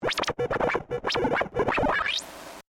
Transmission Burst, Scifi, Transmission, Burst, Science, Fiction; Communication; BlastWave